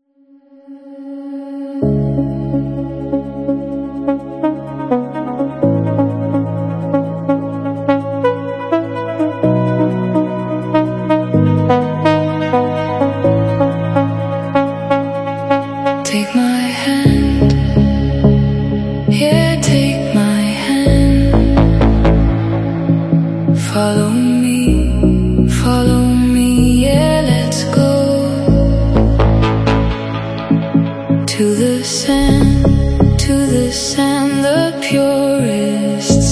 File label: Deep Progressive Rework